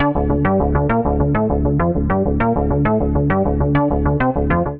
Poly Keys (Dm) 100bpm; Music Loops; Poly FabKeys; Ableton; Factory Packs; Singularities

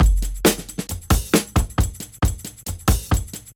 Drums; Suicide Drums; Ableton; Breakbeats by KutMasta Kurt

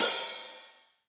Hihat Open Steely Tight; Drum Hits; Ableton; Factory Packs; Session Drums Studio; MPE Hits

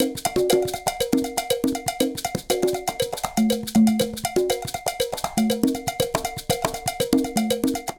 Mozambique 120bpm, Latin Percussion; Ableton; Factory Packs

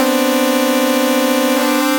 Slow Harm; Inspired by Nature by Dillon Bastan; Vector FM Presets

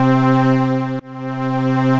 Solina String Section, String Machines; ARP Solina; Ableton; Factory Packs; Retro Synths

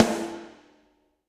Snare Rim; Drum Hits; Standard Hits; Ableton; Factory Packs; Session Drums Club

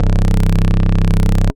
Analog Bass; Fat Albert; Ableton; Factory Packs; Retro Synths; Analog Bass Vol 1

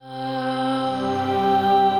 Space Float, Choral Voices; Ableton; Factory Packs; Voice Box; Chromatic Voices

